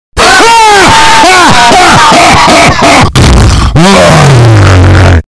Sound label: heavy laugh earrape